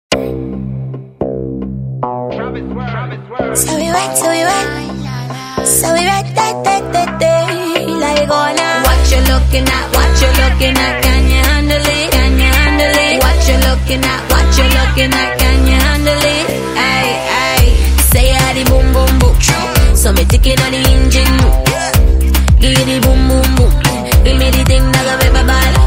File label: oildrum